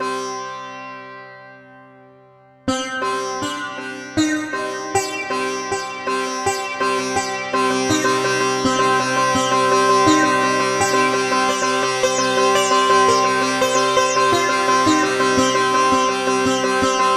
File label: sitar